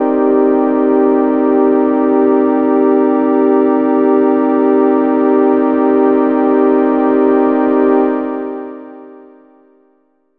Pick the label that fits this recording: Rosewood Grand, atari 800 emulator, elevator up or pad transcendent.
pad transcendent